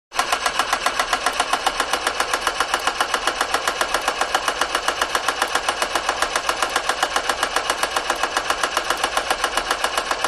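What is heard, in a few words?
Medium Servo Constant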